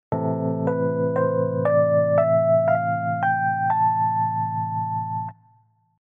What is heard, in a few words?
Am Chord and Scale